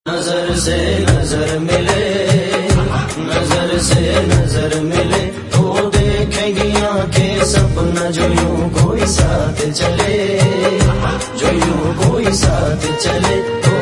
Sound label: lovely ringtone hindi